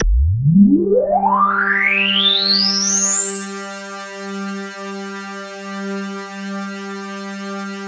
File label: KORG DW8000, Vintage Keyboards